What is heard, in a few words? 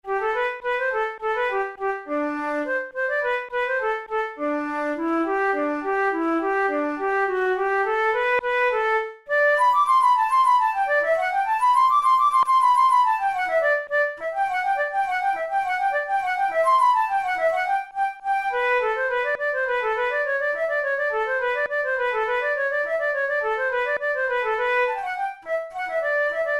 capricen allemande, flute; stamitz